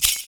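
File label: PERC, percussions; Univers Sons - Raricussions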